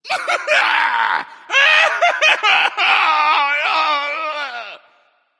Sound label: boo hoo; smite; voicepack